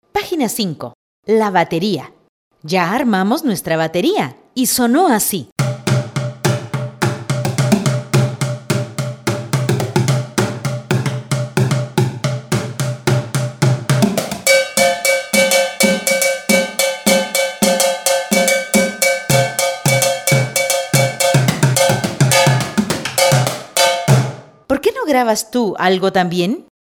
nuestra bateria casera

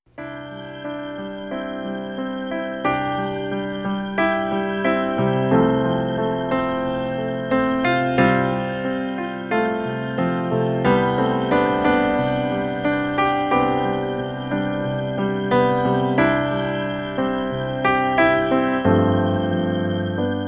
CON FLAUTAS; FORREST GUMP

